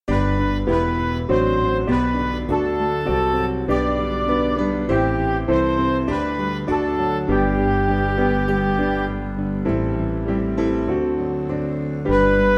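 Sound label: Music Backing Files for Churches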